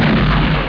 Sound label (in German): schuss; diskmag; suicide